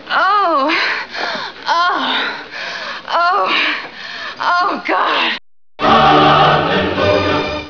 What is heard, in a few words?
orgasm